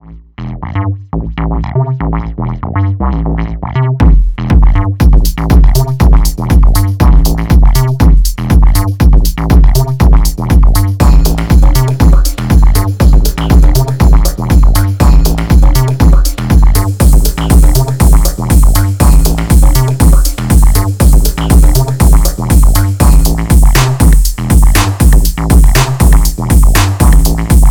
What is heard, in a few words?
hip Hip Vibe